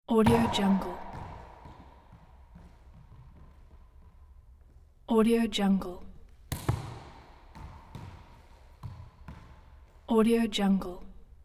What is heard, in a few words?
racquetball hit on court wall